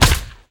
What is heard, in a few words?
baseball hit; lilmana; smite; vamana